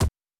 Small Hit; Super Smash Bros

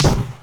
Fire Ball Hit

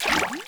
Splash Bubble

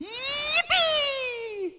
"Yyyippeeee"; mario; mario kart 64

